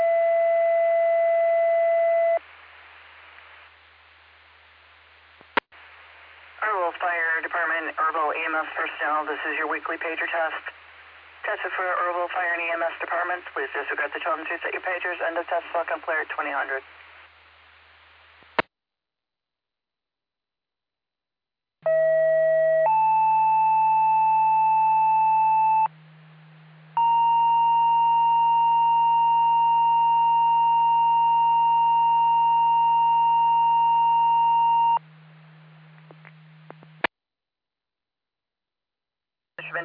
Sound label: Fire; Earlville; TTDAlerts